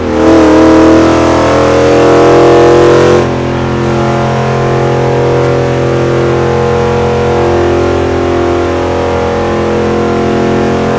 fourth cruise, vehicles; gmod; tdmcars; stingray

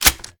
reload empty close; gmod; wfoly plr sh charlie725

